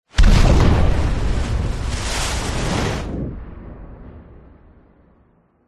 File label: explosion designed large heavy with shockwave